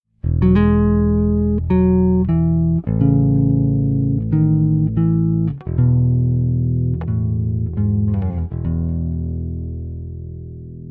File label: Solo Pieces For Bass Guitar